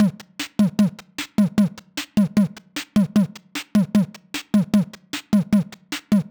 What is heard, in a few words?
stem drums, music loop; arcade